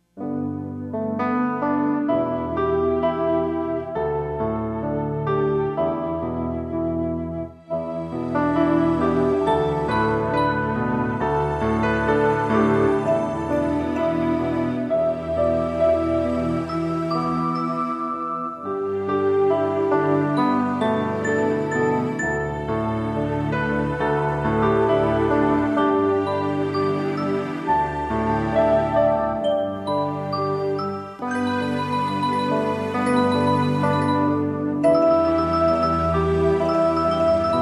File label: music